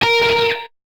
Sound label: Guitar Note; curse crackers for whom the belle toils switch windows gamerip 2022